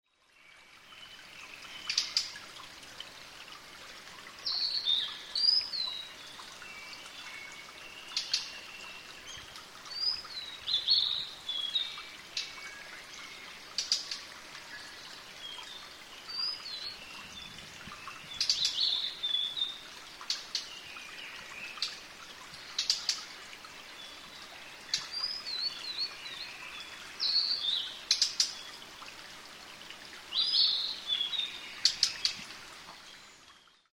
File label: ooruri; オオルリ; 地鳴き; ♀タイプ; 2004年5月29日 静岡県掛川市